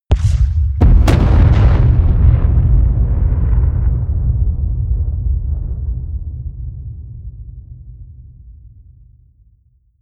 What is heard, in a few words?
air strike